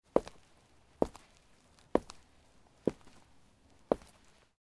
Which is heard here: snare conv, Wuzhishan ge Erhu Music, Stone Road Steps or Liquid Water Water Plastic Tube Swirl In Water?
Stone Road Steps